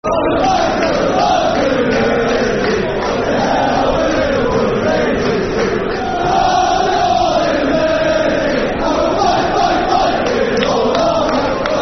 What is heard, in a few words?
Football Chants (Soccer Songs); Banks Of The Royal Blue Mersey; Everton Efc